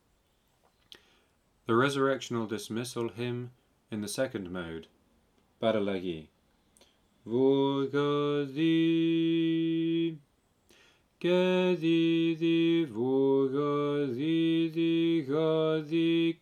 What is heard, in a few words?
Resurrectional Dismissal Hymn Mode 2; paralagi